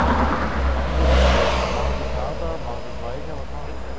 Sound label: benz, driving; akustik